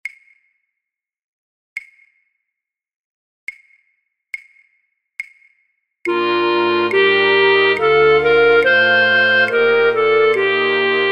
fluitorkest, Fluit 3A1, Fluit 3A2; voor oefentracks; Matthaus Passion

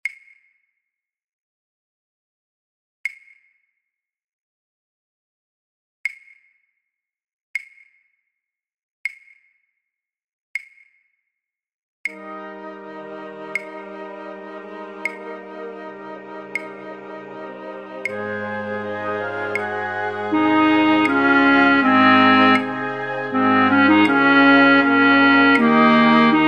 fluitorkest, Altfluit 1B1;Altfluit; voor oefentracks; Matthaus Passion

